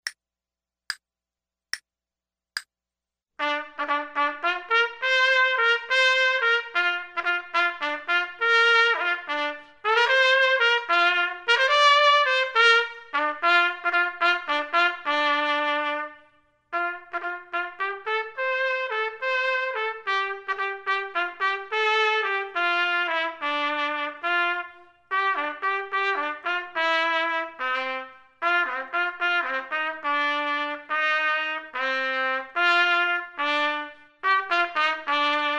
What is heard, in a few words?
trumpet; Selected Duets for Trumpet